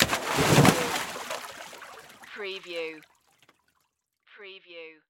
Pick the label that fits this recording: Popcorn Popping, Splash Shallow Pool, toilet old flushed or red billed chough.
Splash Shallow Pool